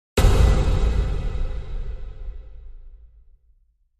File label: Impact Metallic Thud